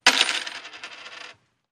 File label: Dropping Handful Coins